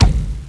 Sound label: grapple hit; blobAndConquer; combat